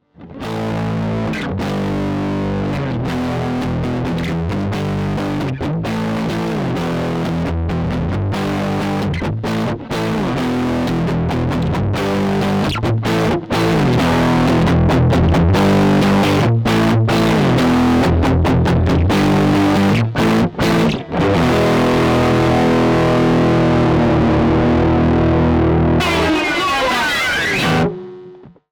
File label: Solo Strat distortion passive jfet Tone Sweep; IK Multimedia Axe IO